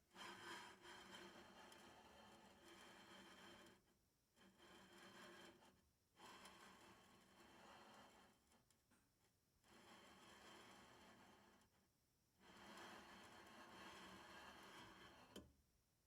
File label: skillet spins on burner